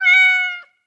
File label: meow